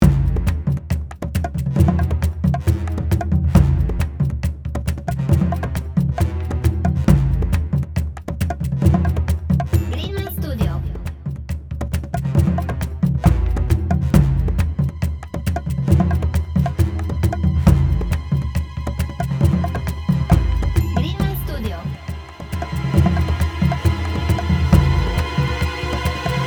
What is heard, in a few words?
Jurassic tension